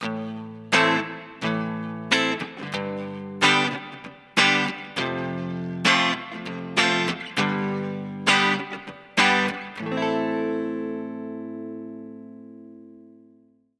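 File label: clean low, guitar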